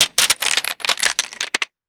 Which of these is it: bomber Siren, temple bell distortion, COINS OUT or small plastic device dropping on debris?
small plastic device dropping on debris